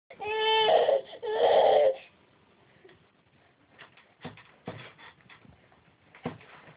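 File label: baby hungry, female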